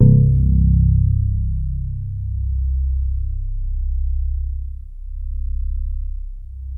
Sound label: DX7 MID1 C1, E-PIANO; Music Store